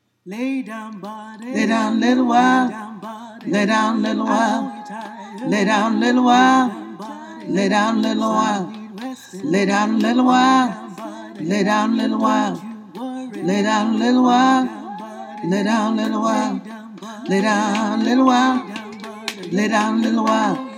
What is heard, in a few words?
Lay Down Body Tenor